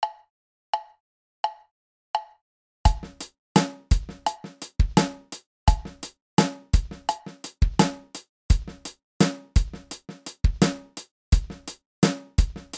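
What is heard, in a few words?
ghost note and accents, drums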